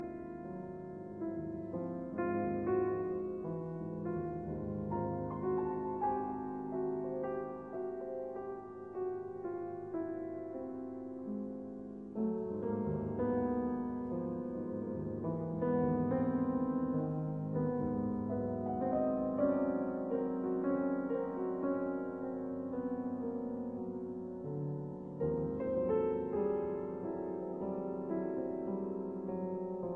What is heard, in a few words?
Piano Sonata in C major; Op 70